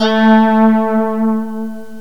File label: star theme, synth sfx; Software Explosion 2 - Virtual Computer Pets; yamaha xg